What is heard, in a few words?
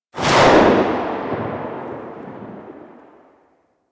Revolver Rifle Tail; Warframe; Tenno